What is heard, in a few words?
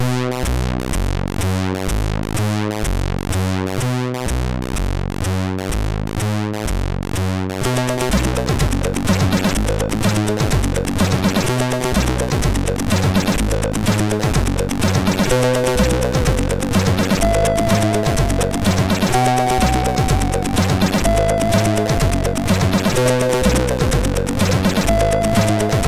Flute, SID MOS6581R3